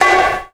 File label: Bongo